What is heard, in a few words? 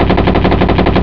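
machine gun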